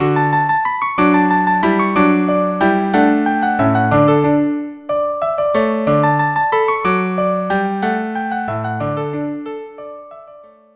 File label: trio; Godi bell alma